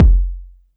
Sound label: BD